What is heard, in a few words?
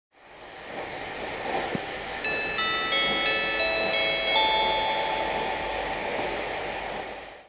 chime; hokkaido